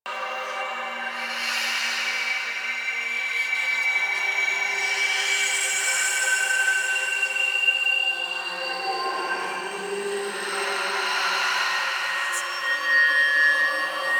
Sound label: Hand Compass Flying Loop; Warframe; Duviri